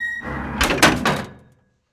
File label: door ladder hatch open start; Rust